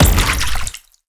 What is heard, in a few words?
tentacle